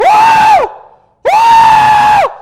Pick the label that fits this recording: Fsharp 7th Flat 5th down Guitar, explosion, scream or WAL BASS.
scream